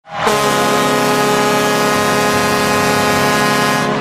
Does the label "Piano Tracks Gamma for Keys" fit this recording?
No